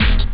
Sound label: techno, drums snare; OctaMED SoundStudio V1